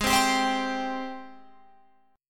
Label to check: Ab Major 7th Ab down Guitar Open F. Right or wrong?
Right